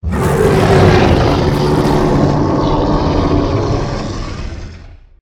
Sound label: Roar; King Kong 2017